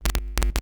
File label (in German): KNISTER, NOISE; Xsample VOL-9